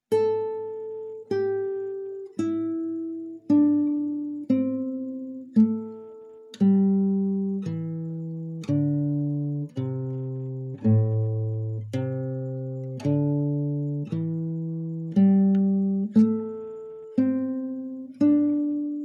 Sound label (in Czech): A moll pentatonika